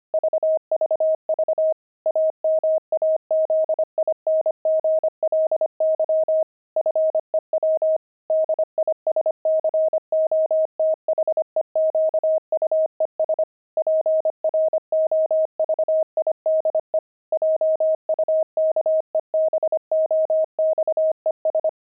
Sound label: pangram disco; Intermediate Pangrams 25cpm